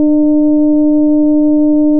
fm sine

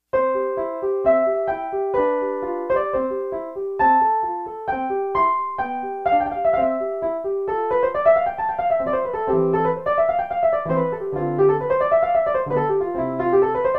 pno

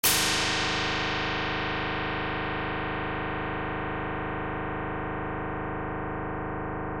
karplus

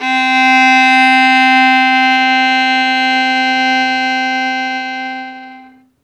violin

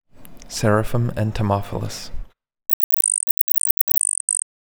birdcall

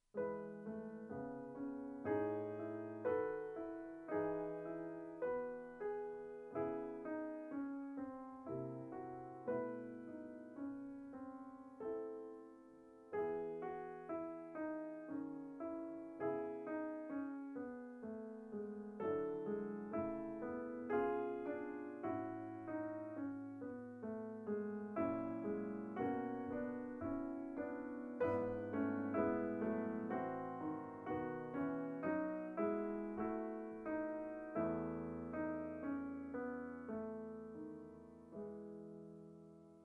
Prelude piano

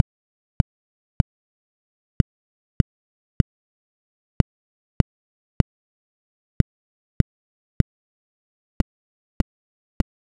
quart; Mauritania

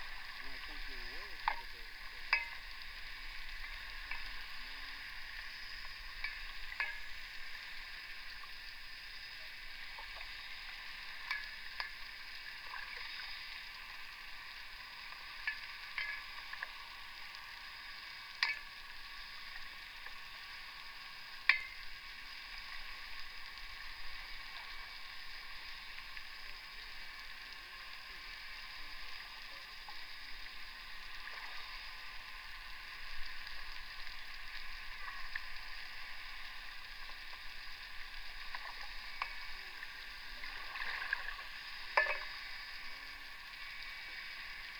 hydrophone small Cymbal; whale Watch

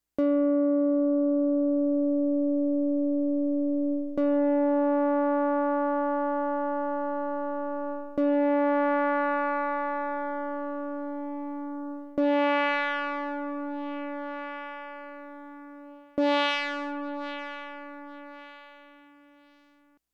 string nonlin

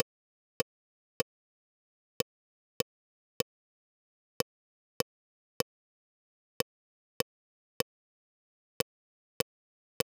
quart Brazil vs Ideal impulse

